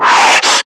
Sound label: Screamer Pain; Warframe; Lasrian